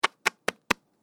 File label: Cards Deck Tapped; Card Sound Effects; casino sound effects